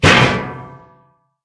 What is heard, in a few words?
headshot